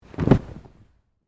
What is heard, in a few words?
Body Fall Pad, Gymnastics; Sports; Miscellaneous Sports; BlastWave